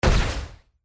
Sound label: magic